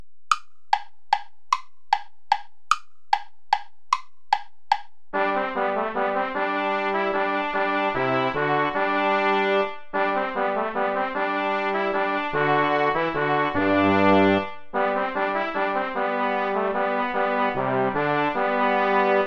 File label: volta play along without trumpet; praetorius michael